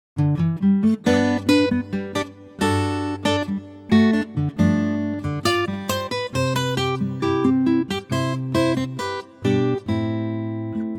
G acoustic guitar; key - G - vocal range - B to C; Superb Acoustic Guitar arrangement; it's really a duet, in the original key

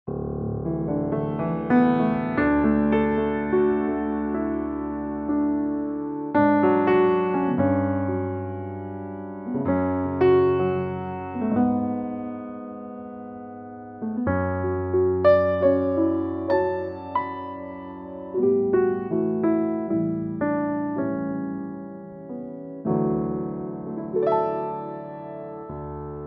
D piano

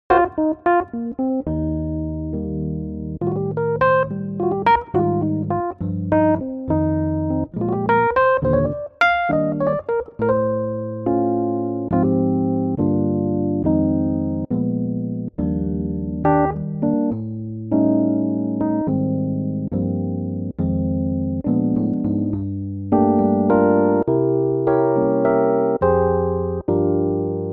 Rhodes Eb

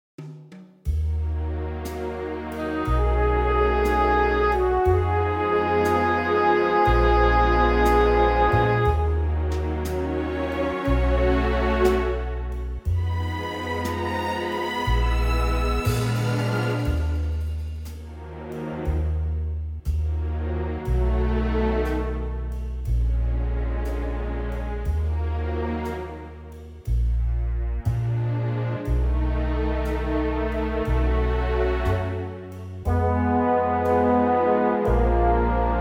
orch Ebm

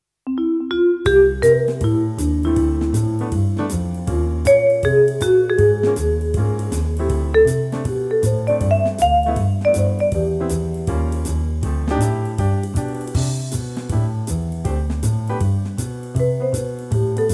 swing

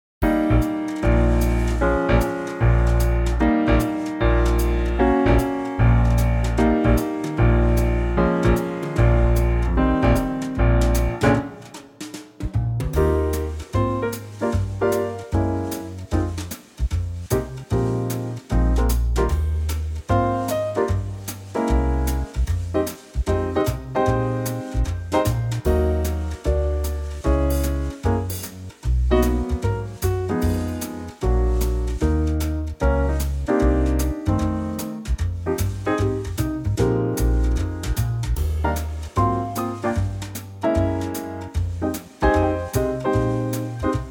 Eb quartet swing

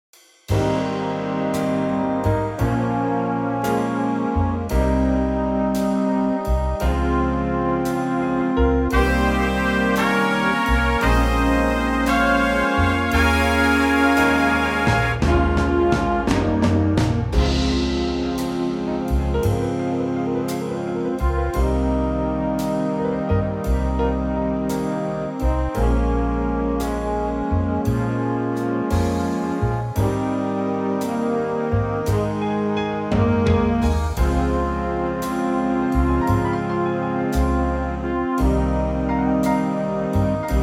Big Band Ab A; vocal range - Db to C#

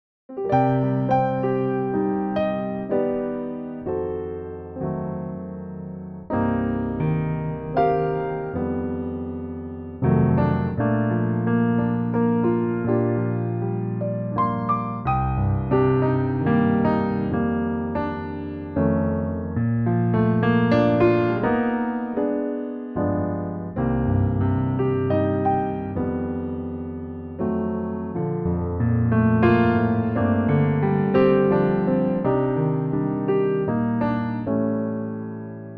Bb Piano; key - Bb - vocal range - G to C; piano only arrangement

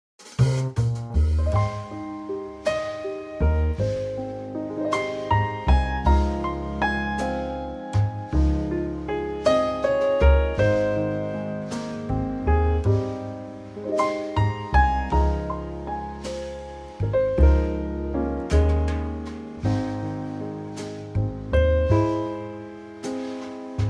Trio; key - Ab - vocal range - F to F

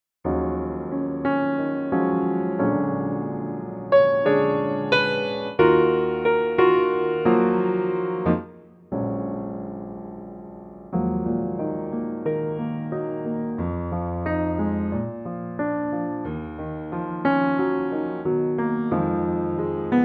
Ebm Piano; key - Ebm; vocal range - F to Ab

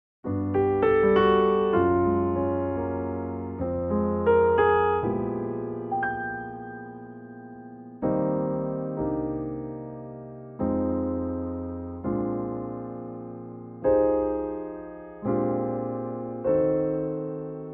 piano; key - Eb; 2016 Pianotrak

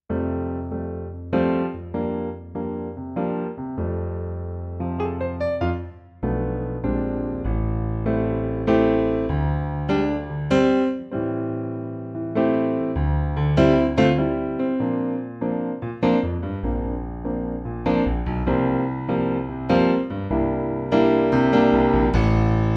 F piano; key - F - vocal range - C to C

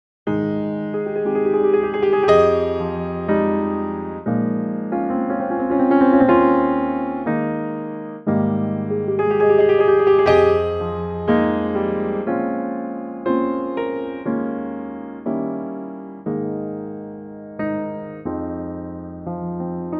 piano Ab; key - Ab - vocal range - Eb to Ab

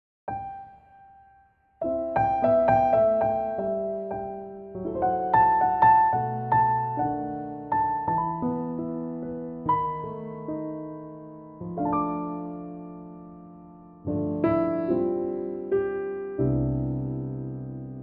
C piano; Pianotrak; key - C - vocal range - A to D; Another beautifully expressive piano only arrangement

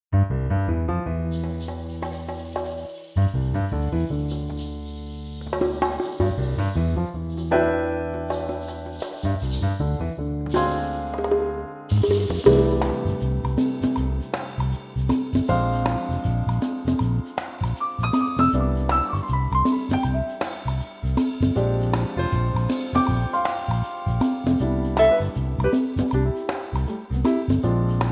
C trio

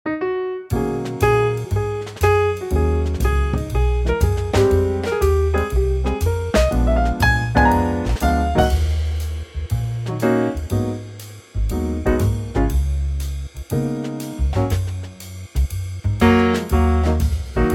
swing; Ebm; key - Ebm (Gb) - vocal range - Gb to Ab; Swinging Trio arrangement

